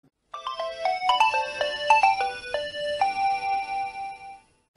Nokia Tune

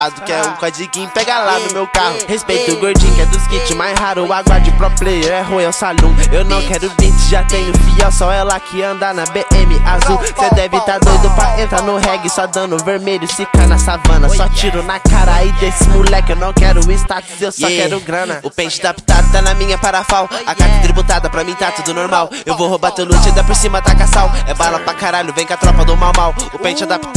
TRAP

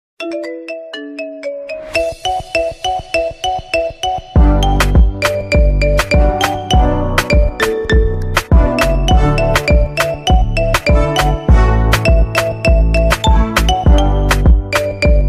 Ringtone Marimba Remix; iPhone 11

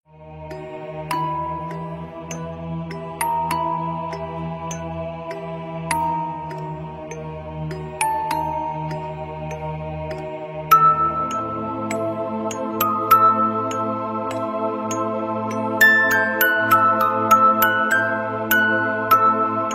Creepy Doll Halloween

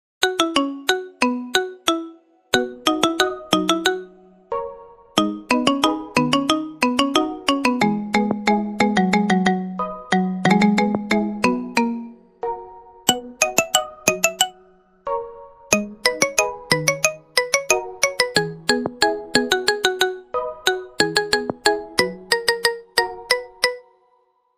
Memories Marimba

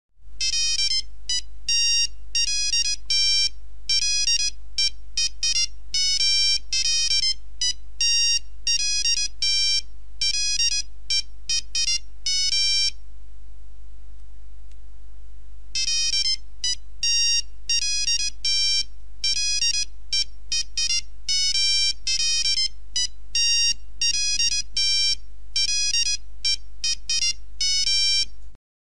nokia 3310 original ringtones

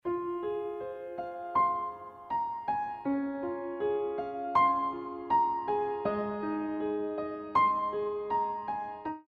Romantic Melody

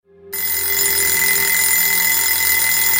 alarm clock

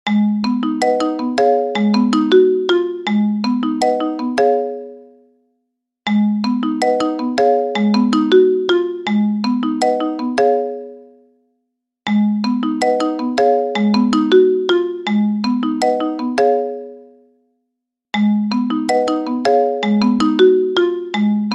Marimba Remix Ringtone

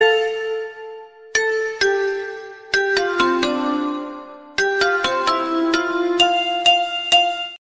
samsung xylophone

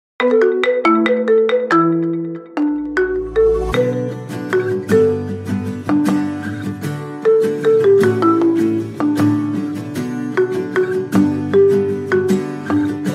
Ghost Marimba

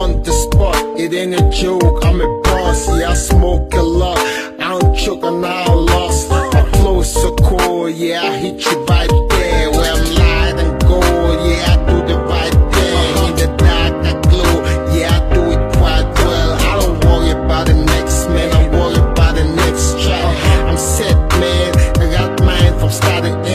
Dubstep; iphone

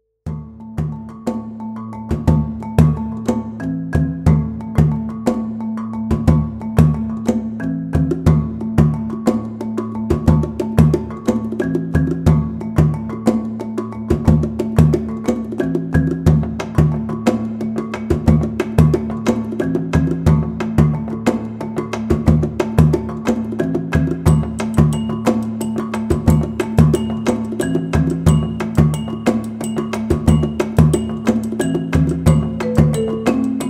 Carol of the Bells Marimba

